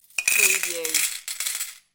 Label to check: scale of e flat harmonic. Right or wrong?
Wrong